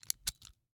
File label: Rachet, vanadium steel, 8in, standard, locking, clicking, mechanical, new, socket release, clear; Eiravaein Works; Vaeyan IV